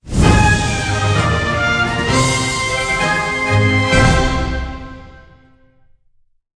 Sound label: outro Free Spin; lost relics